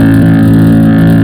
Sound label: RUMBLE IN; AOS F1 2022